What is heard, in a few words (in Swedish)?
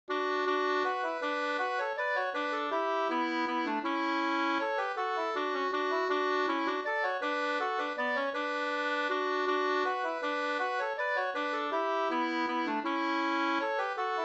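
vals Hmoll, two-part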